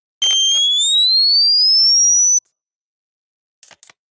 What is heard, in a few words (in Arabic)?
نغمة تنبيه الكتروني; transitions